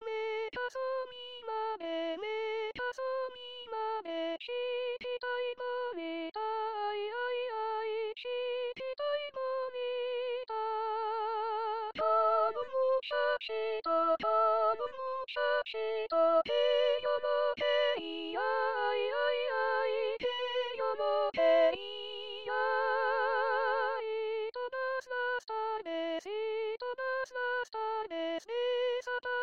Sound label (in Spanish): me caso mi madre TENORES